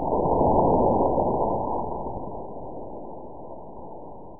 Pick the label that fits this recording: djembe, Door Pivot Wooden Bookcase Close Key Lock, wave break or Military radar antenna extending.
wave break